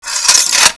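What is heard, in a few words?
saber sheath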